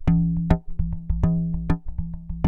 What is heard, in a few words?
DRUMLOOPS, Korg; Back In Time Records; Korg Universe VOL-1